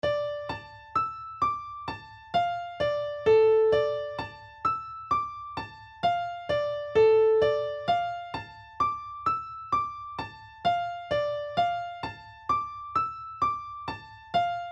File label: Piano Test